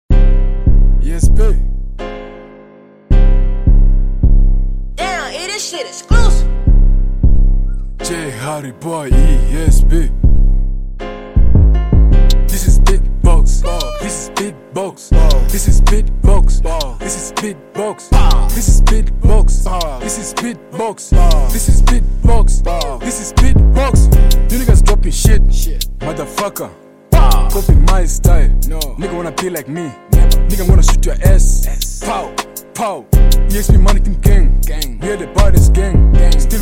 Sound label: Beatbox Free Style